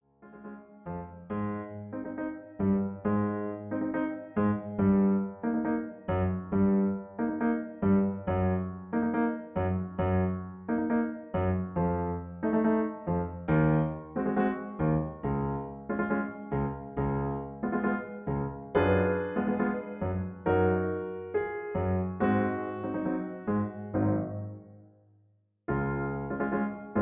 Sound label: Accompaniment D flat